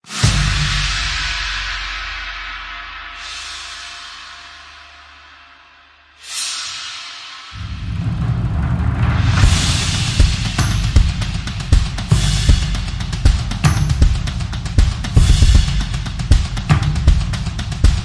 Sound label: Drums Only Version